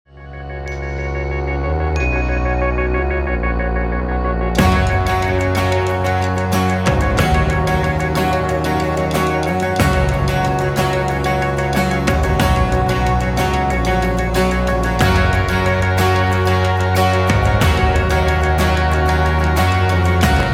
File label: music